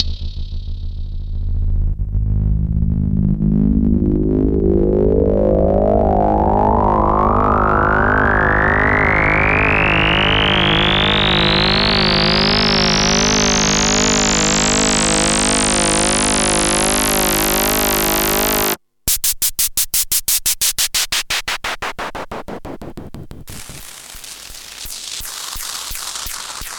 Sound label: Analog Synth SFX